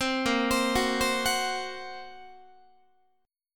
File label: Bb Suspended 2nd Sharp 5th Bb Guitar; 15,18,15,16,16,17; arpeggiated; 4A,4E,5A,5D,5G#,6C#; chord shape; JGuitar